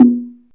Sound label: TONGUE BOX, ETHNIC DRUMS; Zero-G Ambient 1